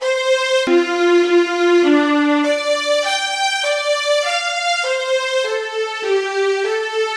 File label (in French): Melodie